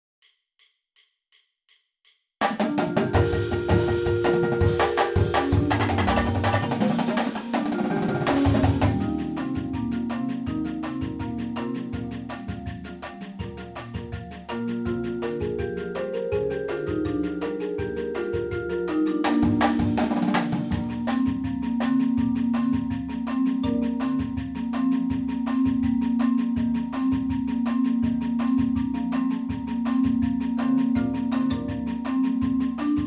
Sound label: PERC